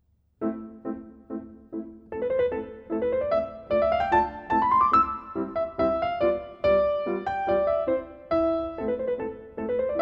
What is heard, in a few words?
Arabesque, piano